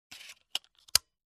Clicking

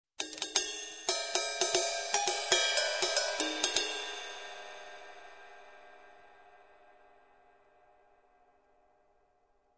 Various Cymbals

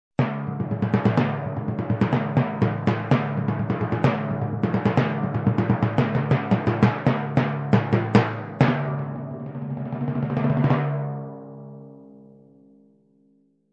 Timpani beat

